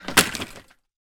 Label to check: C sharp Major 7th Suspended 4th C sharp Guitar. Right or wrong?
Wrong